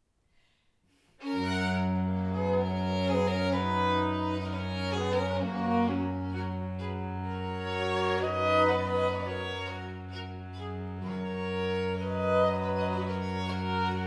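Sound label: Arrangement for two flutes, two violins, viola, and cello; ninette a la cour; STOCKHOLM VERSION; melody “Travaillons, Travaillons de Bon Courage” from Favart’s opera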